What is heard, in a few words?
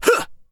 attack; blademaster